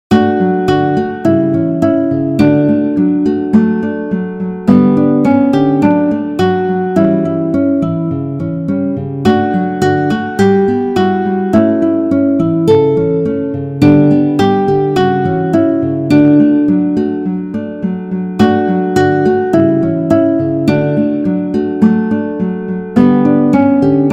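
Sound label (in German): Abend wird es wieder, Gitarre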